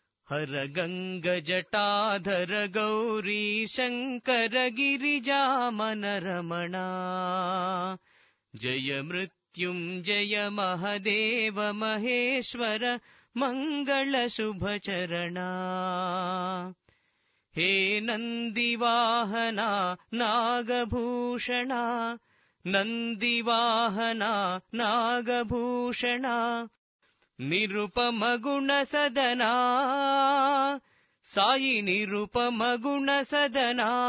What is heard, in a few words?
GANGA JATADHARA TUNE